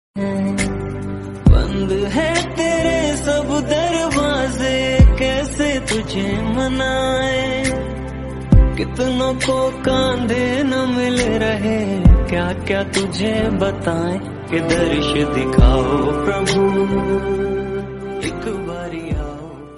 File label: ek baar aao prabhu devotional bhajan hindi; ringtone